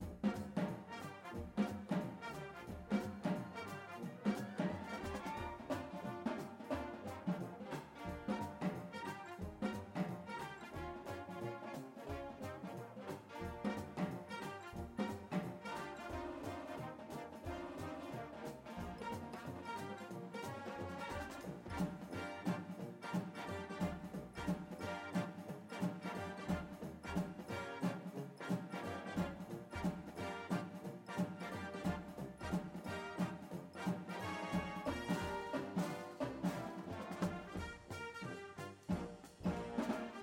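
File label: tenors with music, drumline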